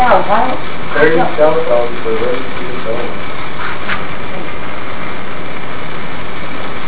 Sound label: unknown whisper; summit ridge